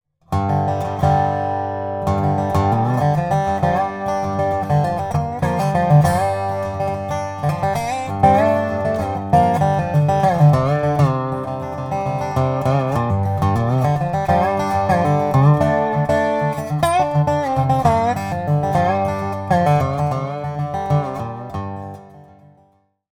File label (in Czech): Rode, Zvuky snímačů – Custom Resophonic Guitars; ukázky zvuku jednotlivých snímačů